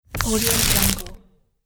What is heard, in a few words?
peel off tape